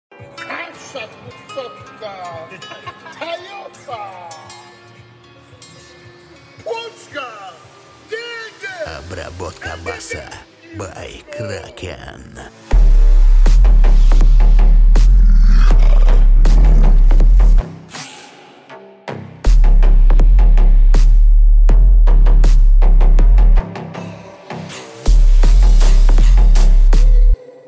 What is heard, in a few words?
35-37, Low Bass; Usa